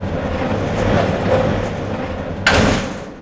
SCANIN; Marbles

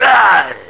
pain; butthead; soldier

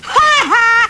Ha ha; Macworld (1999-04); Games World; Shareware Games; Unicycle! 1.4

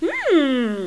hmm

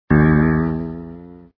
bounce

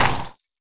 ice; kult

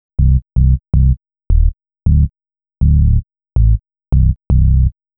SNTH BASS AMBNT 160 C; Ambient

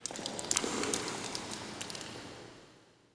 PIPES, pipes; PC Gamer IT CD 40; zones

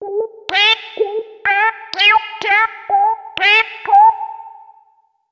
VOICE GRL HOUSE 125 A, House Voice

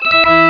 Pipe Organ

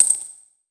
tax farm; PHARAOH

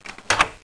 lock; Total Chaos Nukem 3d Pack; duke

